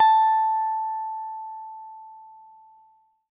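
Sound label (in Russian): gitarnye tony struna b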